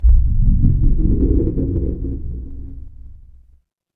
magic scifi alien

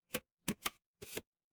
feedback achievement

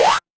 retro game power up

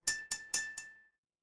glass glass tap

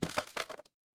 impact crash

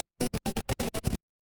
transition glitch

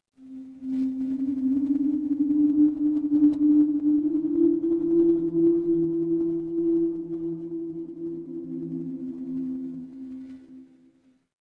horror wind whisper

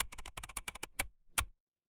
office keyboard

